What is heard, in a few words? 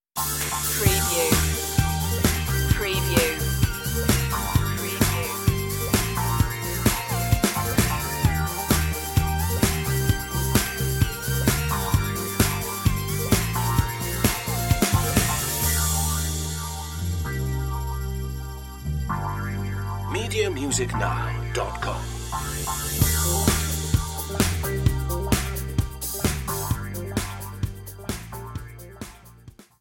boogie disco